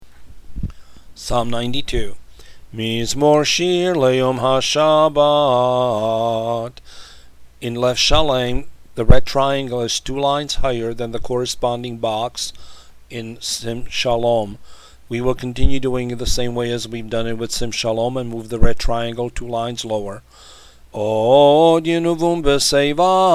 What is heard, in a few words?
psalm 92